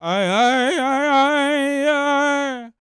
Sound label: World Voices, CHOCTAW